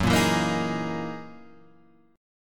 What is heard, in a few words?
F sharp 13th F sharp Guitar Standard